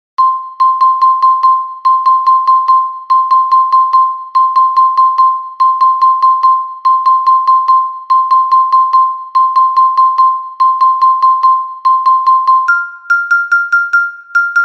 Xota Metalofono Soprano

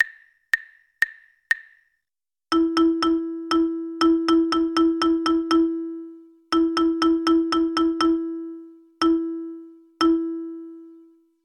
RITMOS

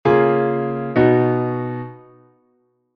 re-si-fa-la; do-do-mi-sol; Que tipo de cadencia é?

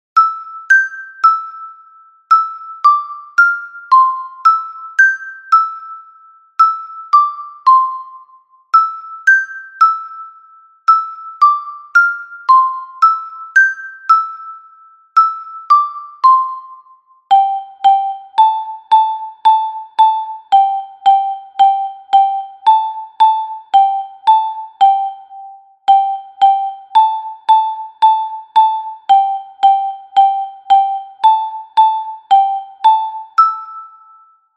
Muineira de Lugo Metalofono Soprano

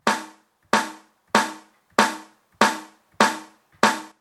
CAIXA; Instrumento musical de batería

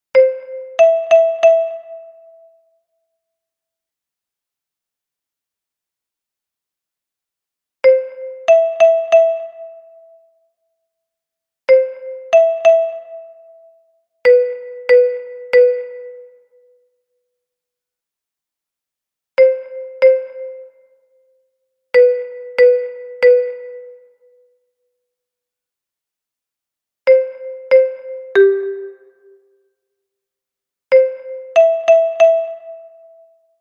Xilofono Contralto; UNHA PEZA PARA CADA FESTA

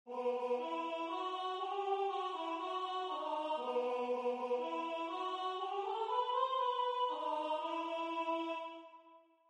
La Tarara retrouso 4 voces voz 3

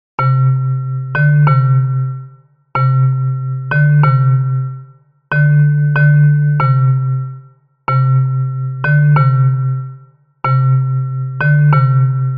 Metalofono Baixo; Pombiña da paz; Unha peza para cada festa

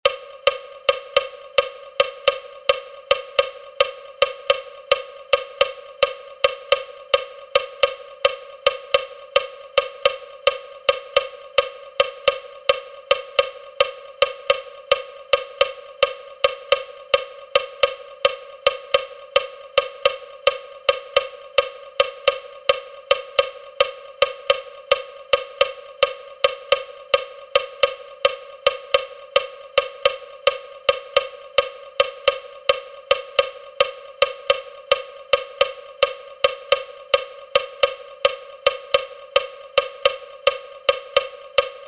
RUMBA CINCO MARINHEIROS Caixa Chinesa

